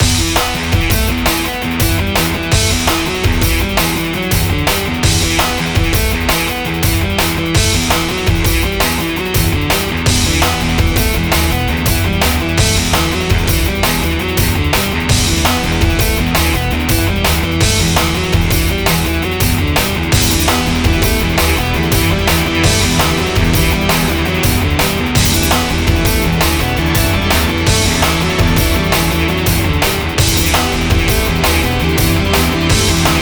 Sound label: guitar